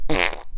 fart

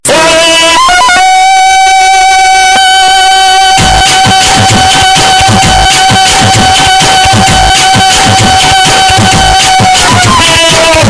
funk

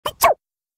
cartoon character sneeze; funny cartoon sound effects

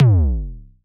tom analog

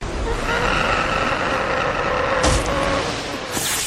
broken roar

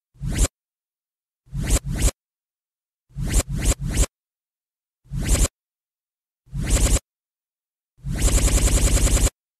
laser

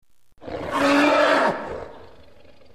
scream

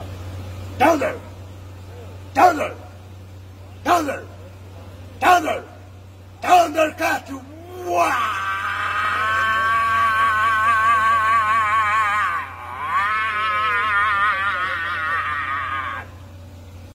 uaaahhhhhhhrrrrggg; thunder cats

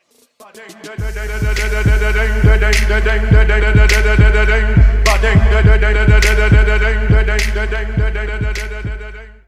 alert follower sub donation sound effect; twitch

